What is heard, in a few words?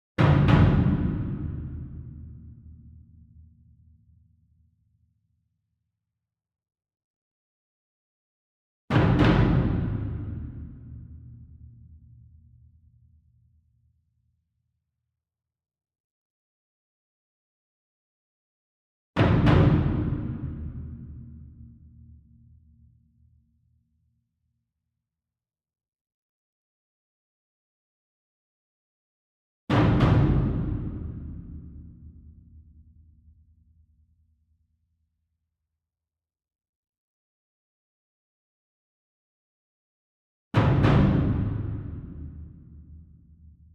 ENSEMBLE DRUM Bass Drum Concert Double Far; boom library; Cinematic Strikes Construction Kit